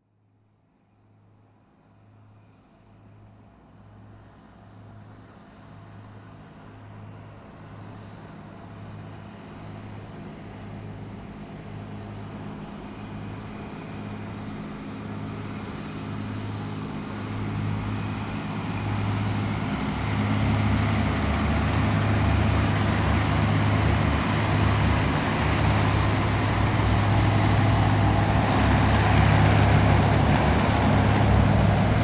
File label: transport hovercraft commercial arriving at port